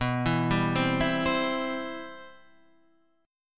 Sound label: C Major B